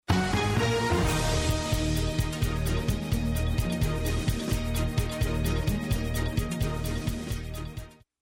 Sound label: radio news open; CBS